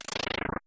Block Smash

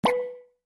Coin

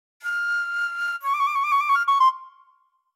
Dialogue Box (Dizi); B Path of the Teal Lotus; Black Bird

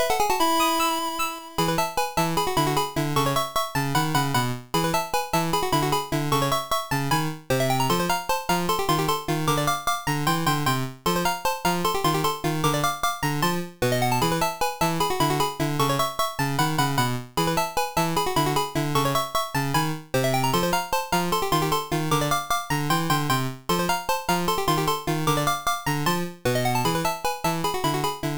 Victory!, GB Sounds; Pokémon HeartGold & Pokémon SoulSilver Greatest Sounds

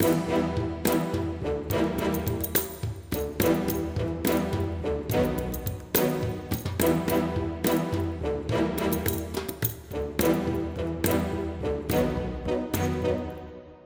Tension; Sheik; tintin reporter cigars of the pharaoh

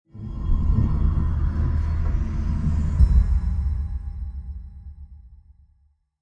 Desert left Hatch Door; Desert SFX

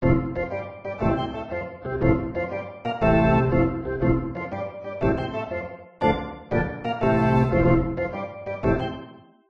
Ditty

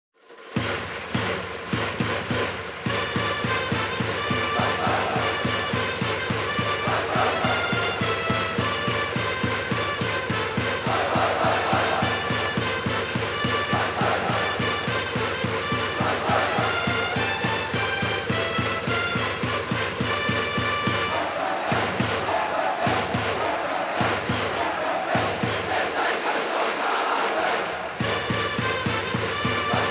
Music Ouen Event; ebaseball powerful pro yakyuu 2020 ps4 switch gamerip 2020

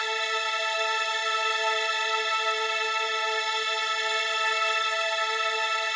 Quest Stinger Transition Strings Loop; Club Penguin Island; Android, iOS, macOS, Windows

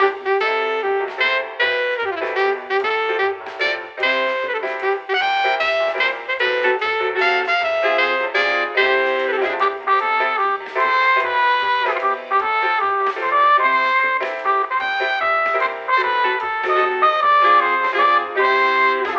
MUSIC BIRTHDAY FX LP; lego dc super villains 2018 nintendo switch gamerip

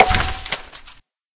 Egg Tap Wood

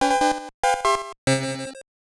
Victory!

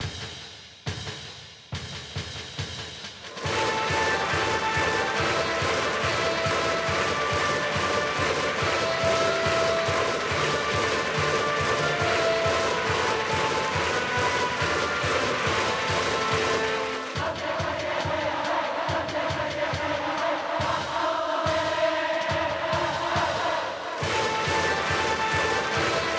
Music Ouen Brassband; ebaseball powerful pro yakyuu 2020 ps4 switch gamerip 2020

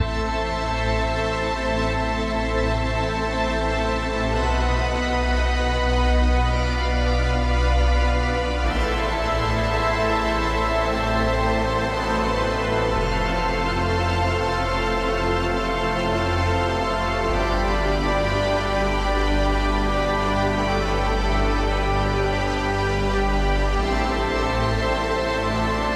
organ; World of Goo 2; Tumbler

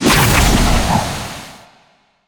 Attack Wind

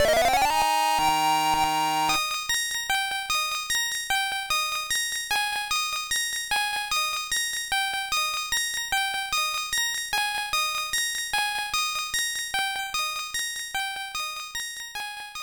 Level Up!; Mother 1+2; GBA

